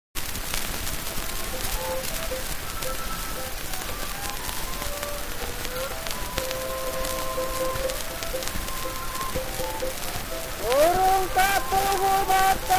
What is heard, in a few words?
Krontjong